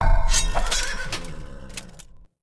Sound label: Build Knight; Civ4 warlords